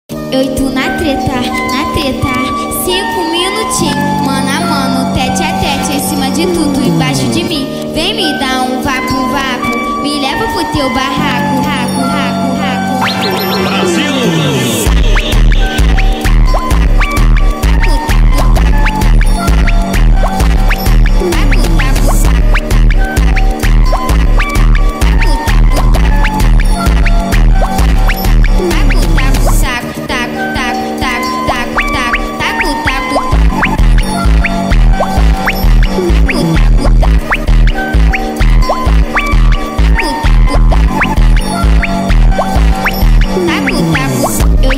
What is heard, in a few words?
brazilian funk remix